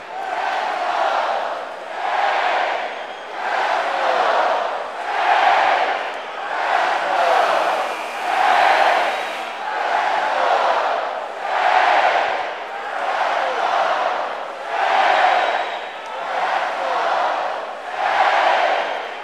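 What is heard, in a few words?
Basketball Crowd Chant Loop; Crowds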